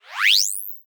screens; soundmorph

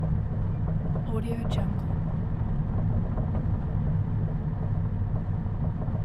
luxury car boot interior uneven road loop

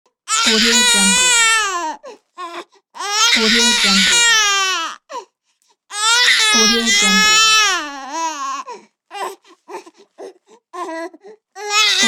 baby crying

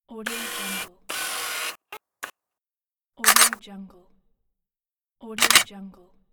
camera autofocus and shutter

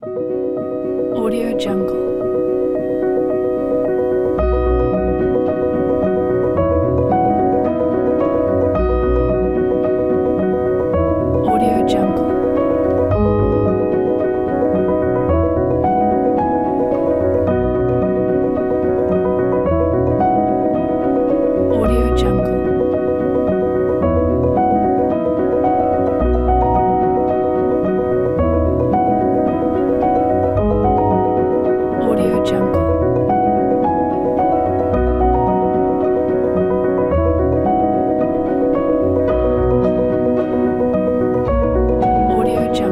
a brisk piano

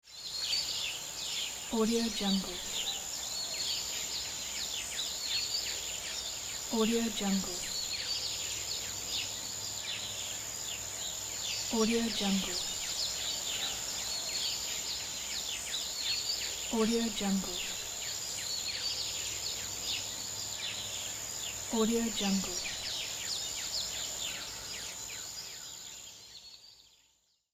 nature sounds

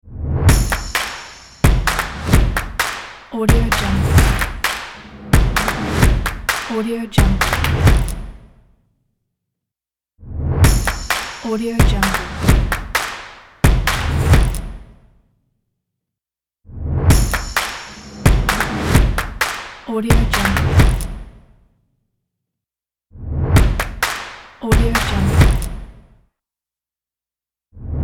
stomp logo

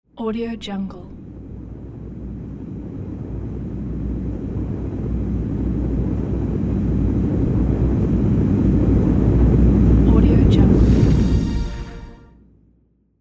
riser

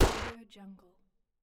scifi small plasma weapon shot

